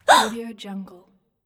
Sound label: female gasp